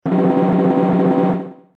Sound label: Drumroll Fanfare; Zelda